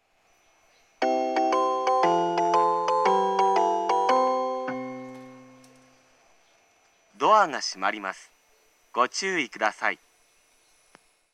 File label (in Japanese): nobori hassha; atsugi